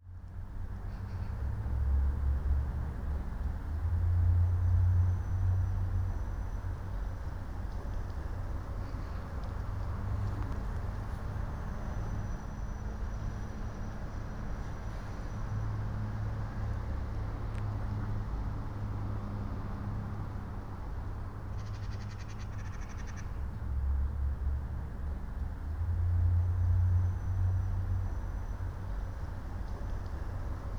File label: NO BELLS, Cathedral Organ; ILIO; Propeller Islands